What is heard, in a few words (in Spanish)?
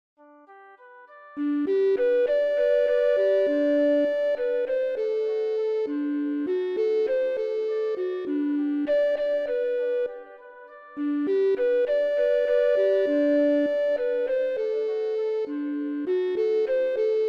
La Jesusita flauta